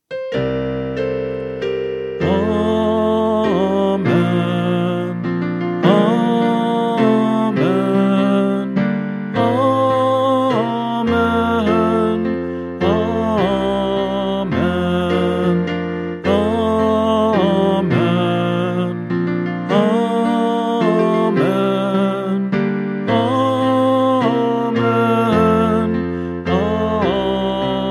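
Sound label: Amen All Parts, Choir Practice Tracks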